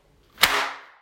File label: gun; カジュアルゲーム